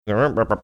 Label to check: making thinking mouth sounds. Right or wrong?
Right